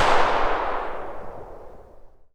pistol fire tail; weapons; Half Life Alyx

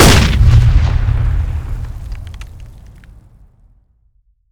sensor explode, grenade; Counter Strike Model Pack; CS:GO; sensorgrenade; weapons

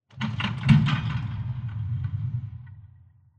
amb combine metal press

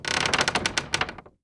window; ambient; inferno

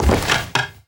fs fm plywood, footsteps; player; Portal 2

